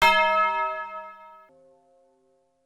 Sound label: church